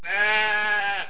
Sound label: SHEEP; Master Clips Email Animator